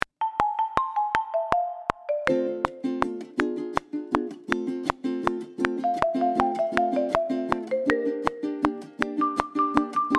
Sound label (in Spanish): Vals en Fa Cuatro